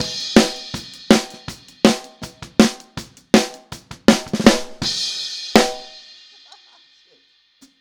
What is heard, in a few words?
Outro Snare, 162 BPM, Drums; Drums from the Big Room The Mixes; Take Your Toms Off